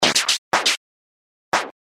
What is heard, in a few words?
rhythm loop Elastic flanger; Sound Graph